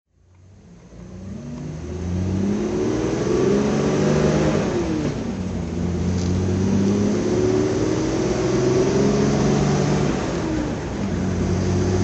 alvis td21 drive

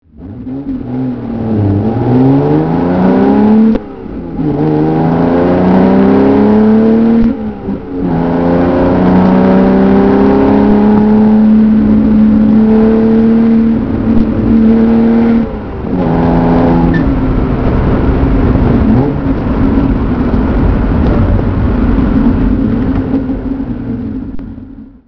c type drive

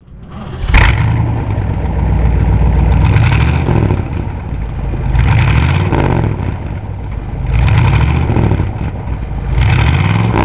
Facel 2M, classic car

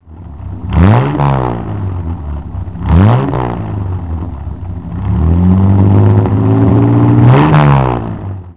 alvis trottle

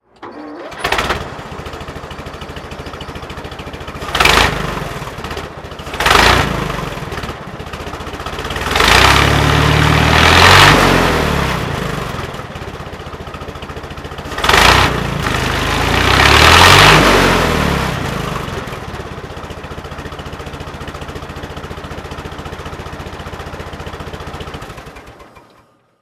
stutz series k throttle; Stutz Series K DH open tourer, 1921; Engine sound; V3D/ ClassicarImages; Marc Vorgers

